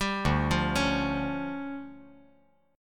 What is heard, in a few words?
D Minor Major 7th Double Flat 5th, down